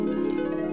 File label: delightful harp; Sound FX; Crowdsourced Sample Pack